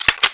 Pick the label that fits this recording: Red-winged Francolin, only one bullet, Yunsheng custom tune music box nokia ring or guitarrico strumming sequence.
only one bullet